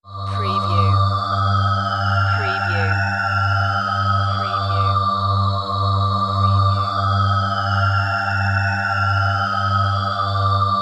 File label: Forma Sweep B